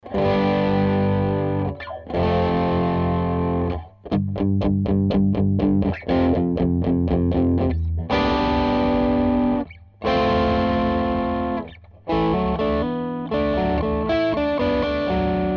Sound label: Borderline Crunch Overdrive Guitar